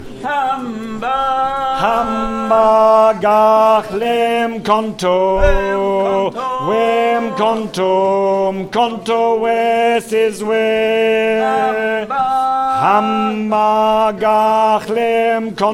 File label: Tenor